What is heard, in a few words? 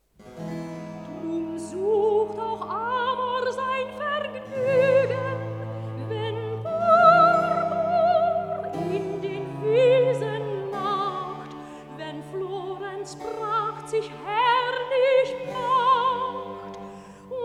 Wedding Cantata - Recitativo; Drum sucht auch Amor sein Vergnügen; Baroque; J.S. Bach; Secular Cantatas; BWV 202